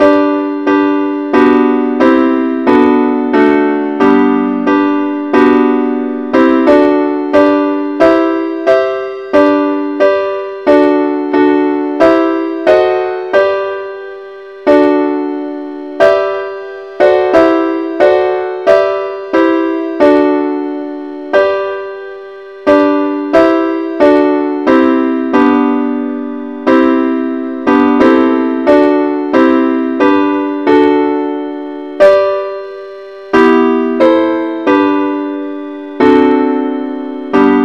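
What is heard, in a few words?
advanced accompaniment, key G; christe Sanctorum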